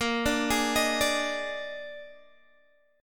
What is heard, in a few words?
Bb 11th, Guitar, DADGAD